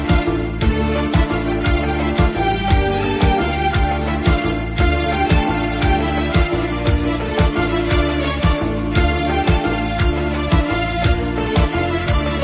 Melody

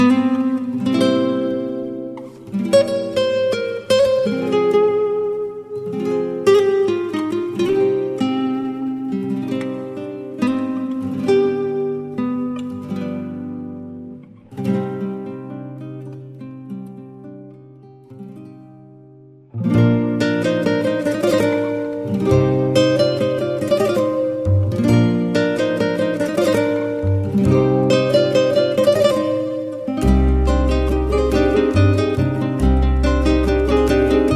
Guitar Ney

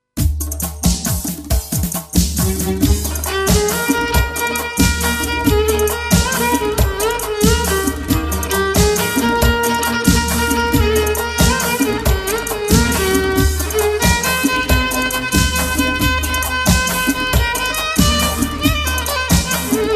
Violin

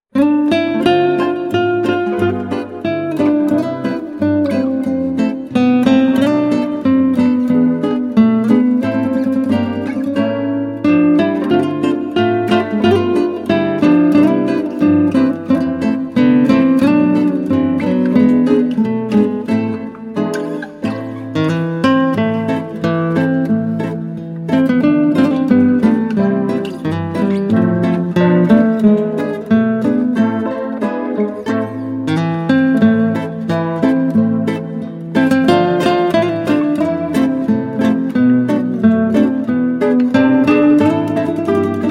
Guitar

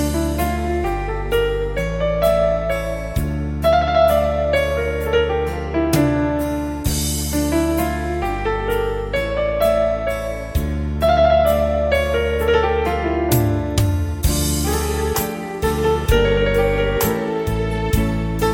Keyboard

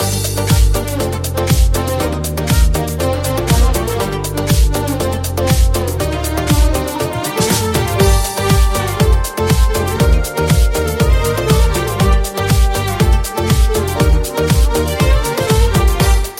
Remix Melody

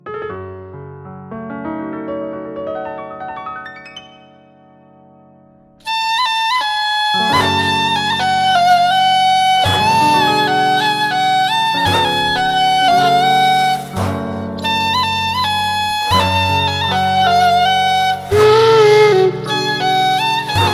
Ney

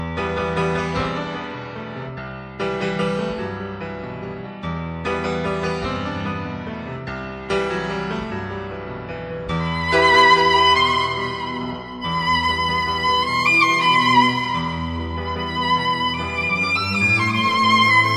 Violin

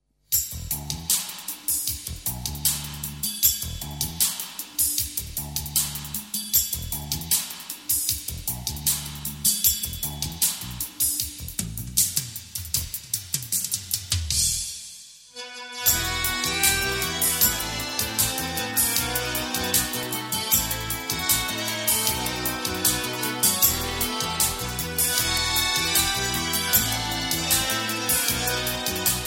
Guitar

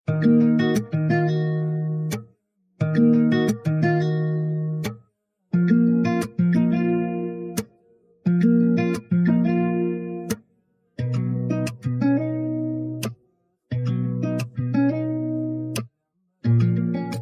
Guitar Version

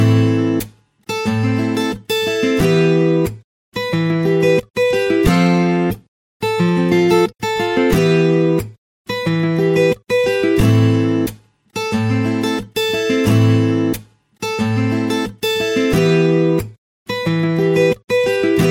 Guitar

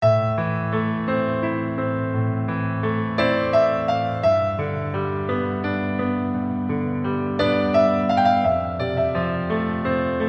Piano

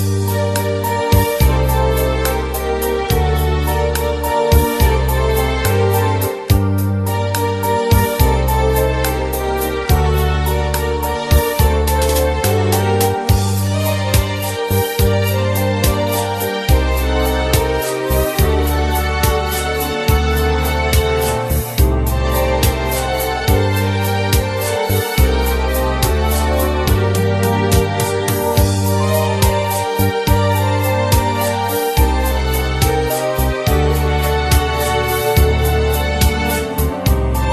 Flute

